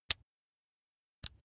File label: razor attachment remove